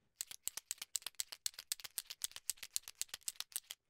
Shake spray

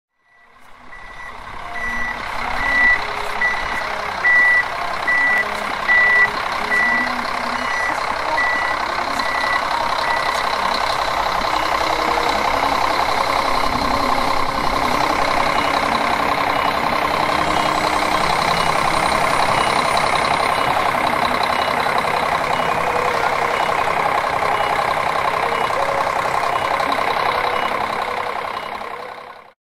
Snow plow tractor; تراکتور برف روبی

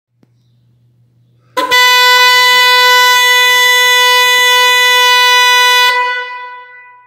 fiam cadillac horn; فیام کادیلاکی

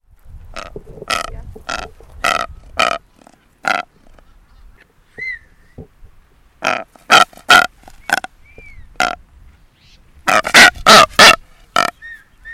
Blue footed booby; پرنده بوبی پاآبی

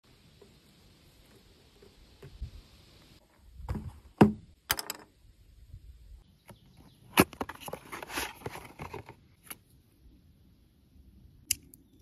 sniper

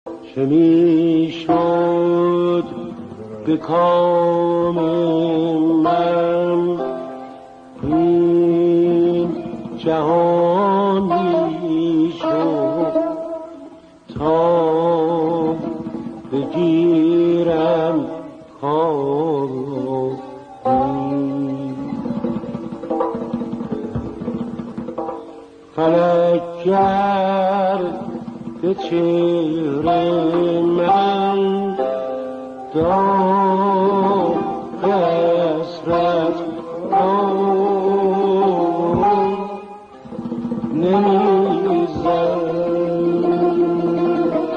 tar

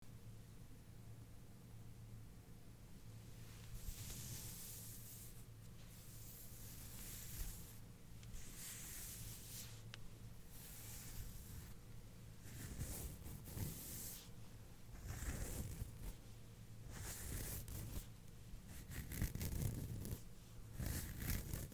nails on skin

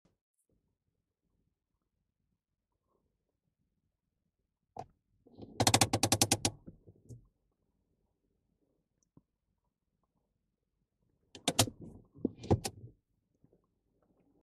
Car handbrake

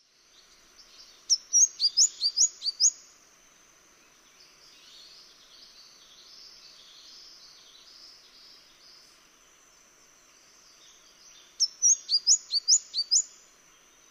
Mistletoebird

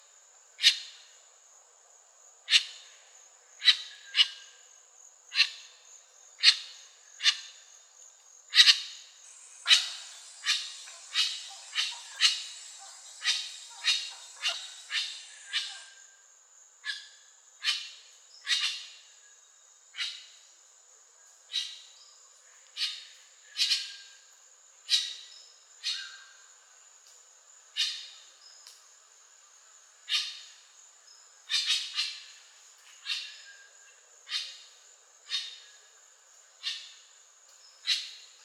Grey winged trumpeter

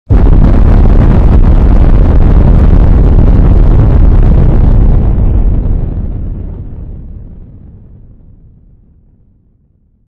Avalanche

